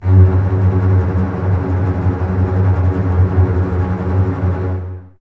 F#2, Basses Tremolo, String Ensembles; Miroslav Vitous; 90 s Sample CDs

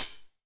SR TAMB, Drum Mashines, SR-16 DRUMS; EdgeSounds

